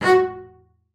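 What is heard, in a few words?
marcato F#4 left, bass spiccato; Xsample VOL-5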